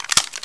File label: elite clip out, weapons; czero opforce